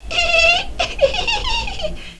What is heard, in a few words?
giggle